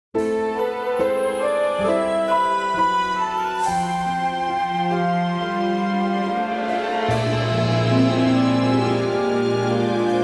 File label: instrumental version